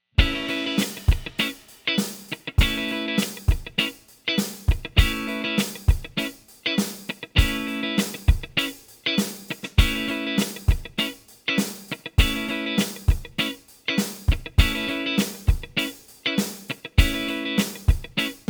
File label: cuting